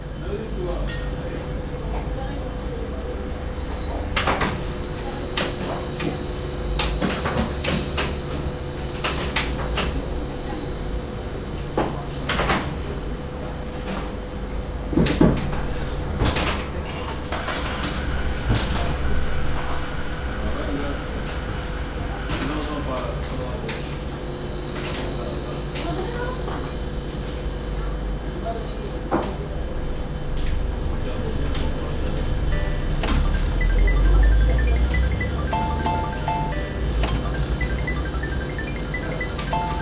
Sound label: Ambient Bar; The Warriors Street Brawl; xbox 360